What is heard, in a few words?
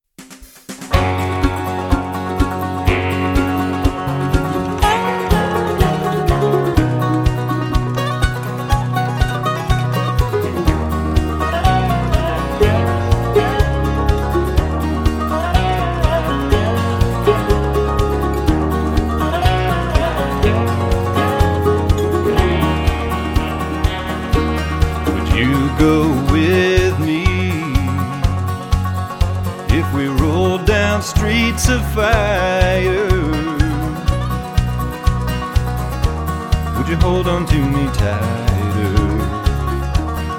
music